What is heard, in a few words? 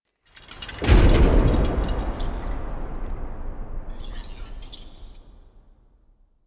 Movement A; The New War; Erra; Cinematics